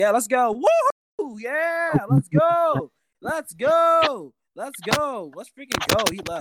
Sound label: woohoo; kingsnake